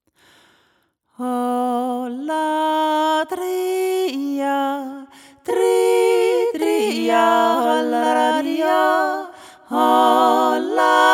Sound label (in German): hasbacher jodler; langau 2025